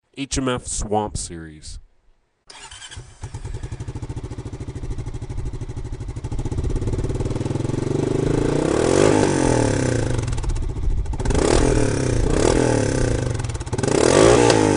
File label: foreman 500 perf swamp, exhausts; swampxl